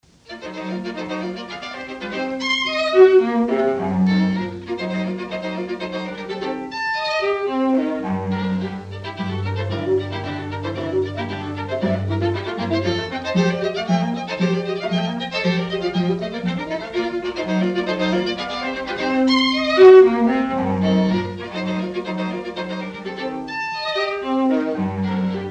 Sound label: string quartet